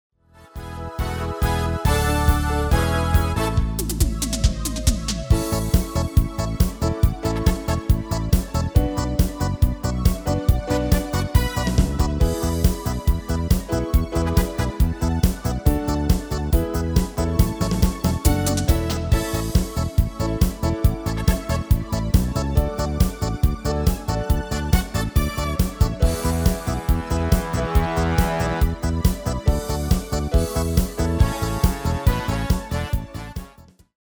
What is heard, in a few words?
MIDI